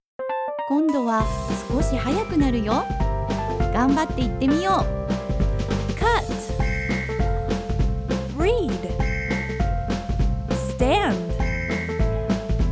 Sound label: hawaiian; Kindergarten Practice